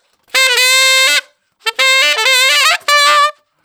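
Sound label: 125 NuJazz Sax D major; Brass; Digidesign; Pro Tools; Pro Tools Essential Loops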